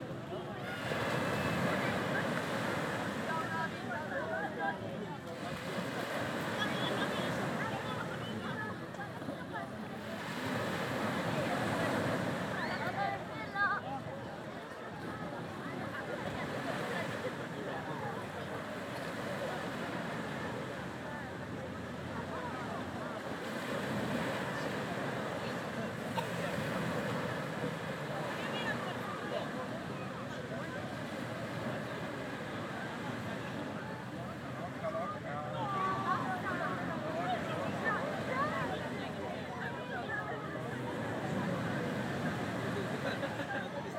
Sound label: Beach Waves